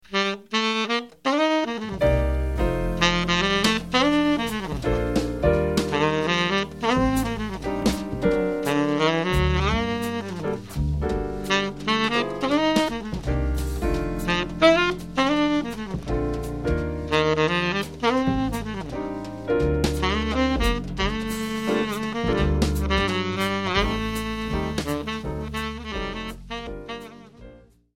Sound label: subtone, sax